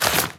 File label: item raise